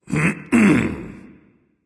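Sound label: nonverbal clear throat; smite; voicepack; ullr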